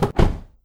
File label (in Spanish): puerta; vehicle mod